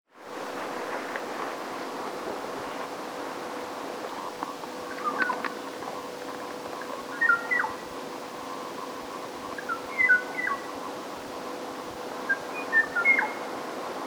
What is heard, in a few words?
bird